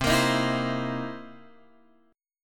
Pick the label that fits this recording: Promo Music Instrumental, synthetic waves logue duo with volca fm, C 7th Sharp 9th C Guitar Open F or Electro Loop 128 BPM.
C 7th Sharp 9th C Guitar Open F